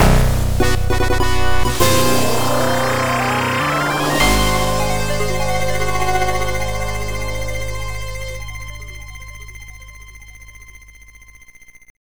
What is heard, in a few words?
sfx badguys; shovel knight dig